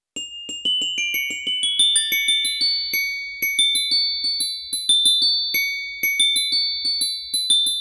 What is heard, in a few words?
Bells; Sonic Foundry (Sony Creative Software); Cinematic Funk Urban Tone Poems